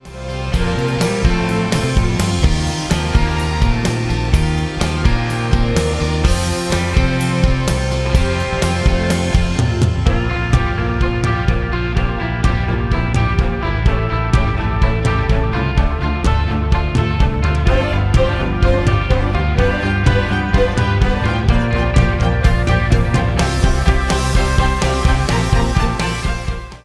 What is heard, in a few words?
Accompaniment